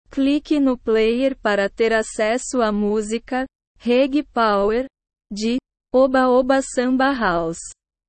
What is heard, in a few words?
reggae power